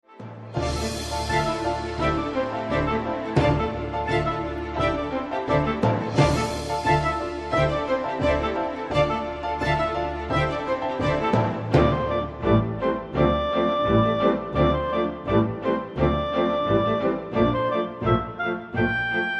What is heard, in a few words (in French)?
Loup Tambour